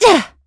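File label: Vox Landing, English; Estelle; kings raid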